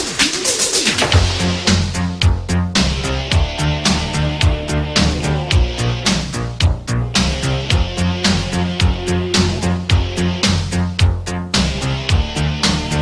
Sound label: 80s classic